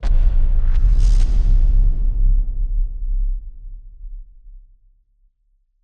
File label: Hit Splosion; musicradar; bomb factory